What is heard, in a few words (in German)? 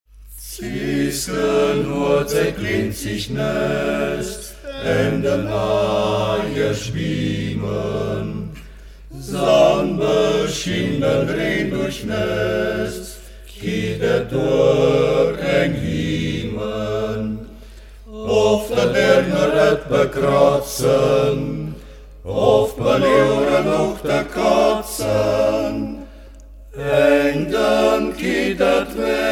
oktett; Ortsmundart: Mediasch; Zeïsken huët seʼ klinzij Näst; Mediascher Oktett; Hegt wird gesangen